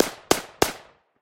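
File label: machine gun sounds; zvuki avtomata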